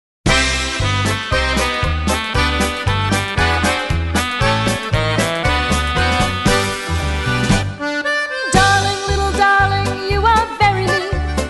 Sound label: Squeeze Your A Cor Deen